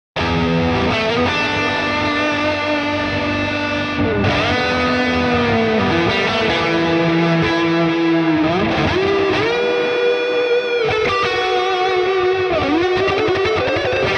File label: Dreamy Impro; Archetype Abasi